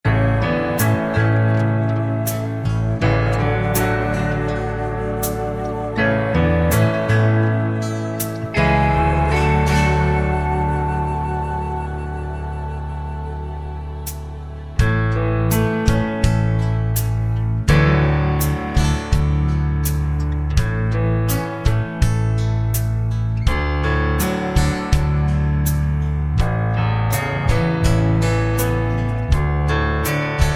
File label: A No Backing Vocals